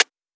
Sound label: Closed Hihat; Cymbals; Mutekki Media; Vengeance Electroshock Vol.2